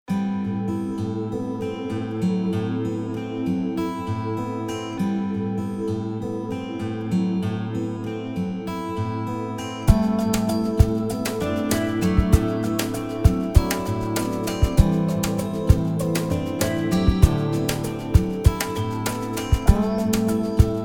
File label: Rock